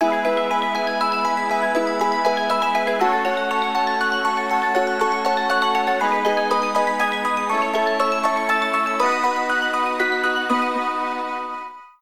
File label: Jam Ring, alarms; Fujitsu; Arrows-Tab-LTE-F-01D